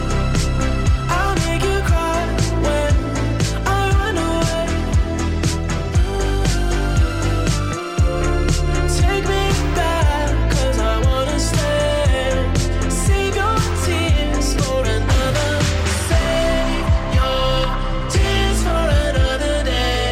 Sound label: ringtone new